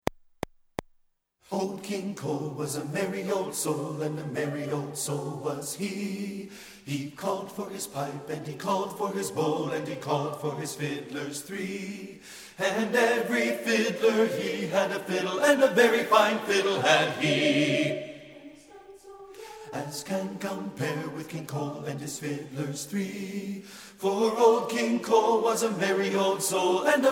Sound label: BASS; satb